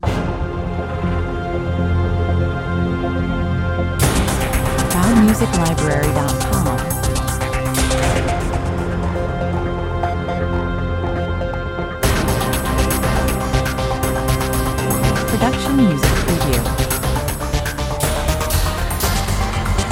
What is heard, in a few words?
crime intro orchestral, 120 bpm